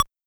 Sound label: High Beep, Concertmate Drums